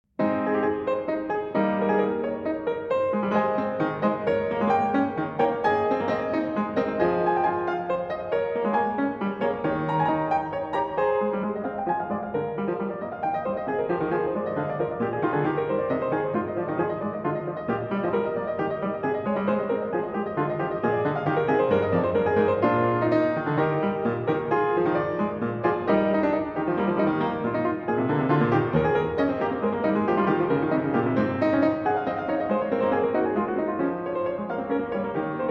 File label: Prelude & Fugue in A-Flat Major, BWV 862 I. Prelude